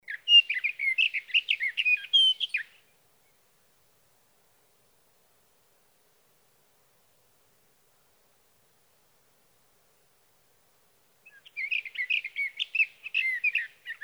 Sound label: Sage Thrasher